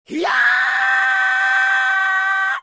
screaming; chuck

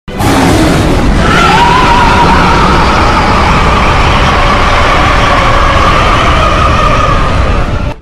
scariest roar

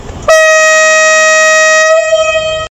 high horn; csr

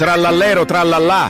tralalalalalala

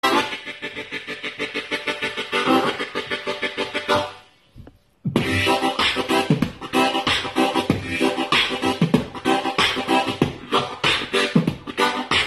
garmoshka bitboks; gubnaia

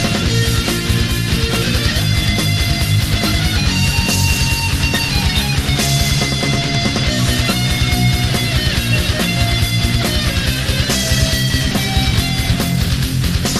metal version; hyrule

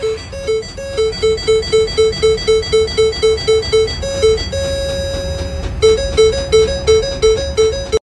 ford chime goes nuts

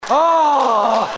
ughhht

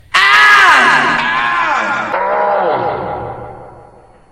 ahh 3x

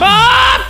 scream; mini ladd

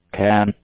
can

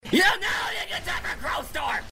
crowstorm

